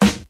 dark snare drum g sharp, Royality free snare tuned to the G# note. Loudest frequency: 1154Hz